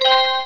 collect

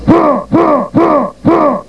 HIHIHIYA; The SoundBlaster Multi Media Experience vol 4

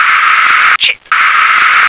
chip

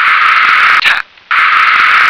tap

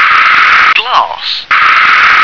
glass

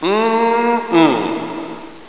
hum

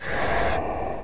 air out

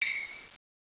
DROP

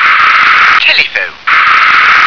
telephone

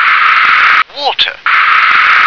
water

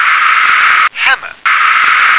hammer

